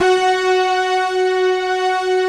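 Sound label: Obie Stack, Slow OBlip; Optical Media International; Sonic Images Library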